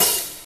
open hi hat sample a sharp key, open hat sample tuned to the A# note